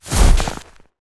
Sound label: snowman death; Minetest; VoxeLibre; mobs mc